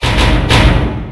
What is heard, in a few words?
boss gate dam